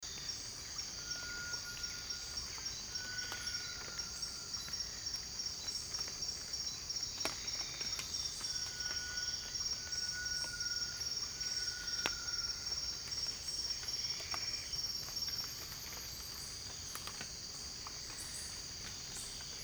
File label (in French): Tinamou varié, Le sifflement legato-glissando du tinamou varié digne d'un western forestier; Guyane